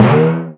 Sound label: bounce